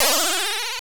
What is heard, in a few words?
death